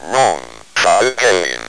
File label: spell